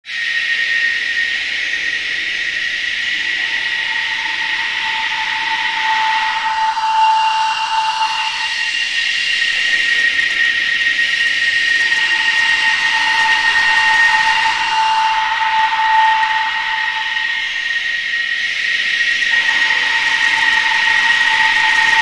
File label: Cicadas